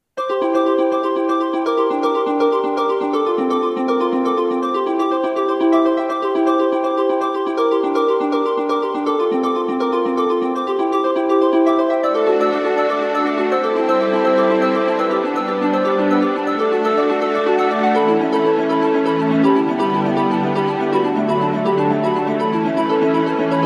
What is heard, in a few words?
Arpeggio